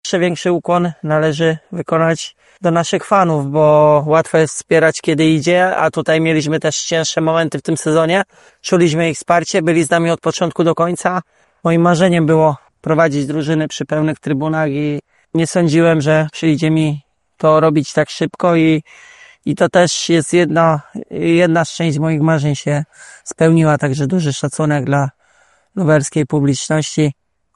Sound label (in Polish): fani; Motor Lublin